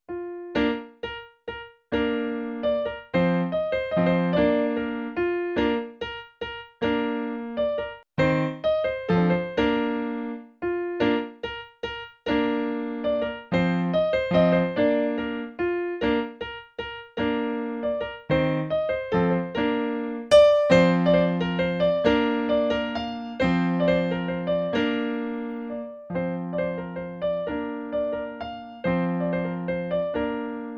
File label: Minuet; J. C. Bach; piano solo; Classical